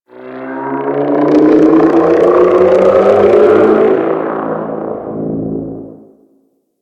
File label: Exterior Small Ship Passby; Ambience; Warframe; Corpus Railjack